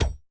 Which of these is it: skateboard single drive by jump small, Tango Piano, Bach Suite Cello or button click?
button click